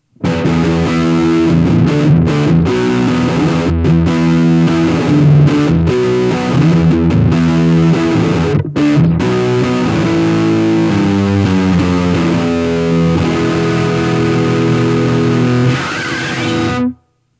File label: insane, micro spider; line6